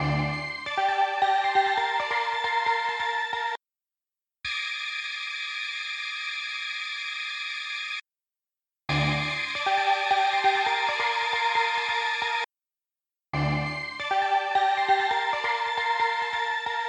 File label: synth